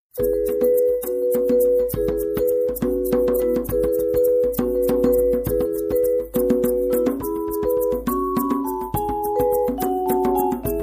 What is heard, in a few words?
Vibraphone